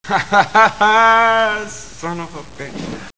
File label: sob